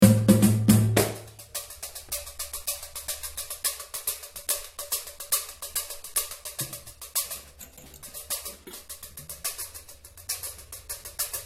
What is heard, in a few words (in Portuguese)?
reco-reco